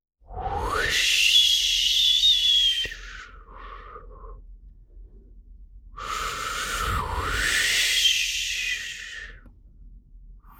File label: Calming ocean sounds